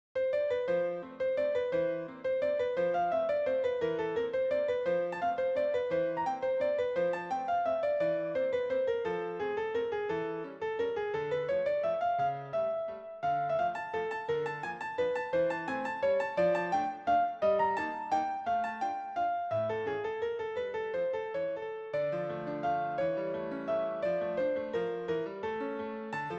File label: Waltz in F